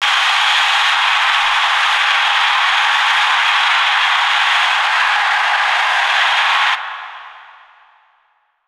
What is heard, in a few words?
atmo; Techno Trance Essentials; FX & ATMO